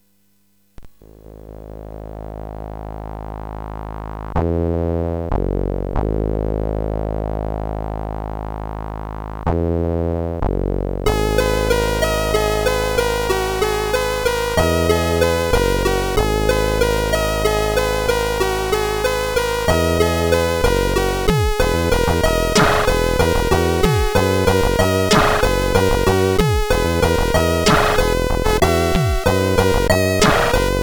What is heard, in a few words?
Static Remix, CSG8580R5; hvsc